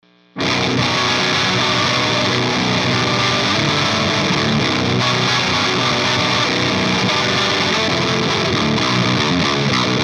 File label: Riff Original